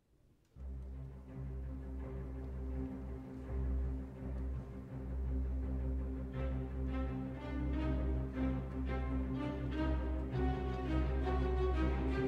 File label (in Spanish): Concierto para trompeta y cuerdas; V. Kruglik